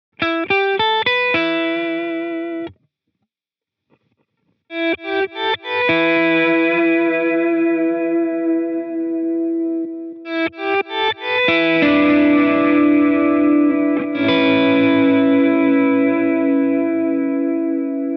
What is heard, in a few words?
Ambient Triggered Swell